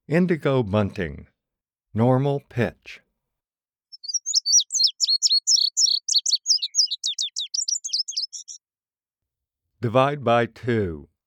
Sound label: Indigo Bunting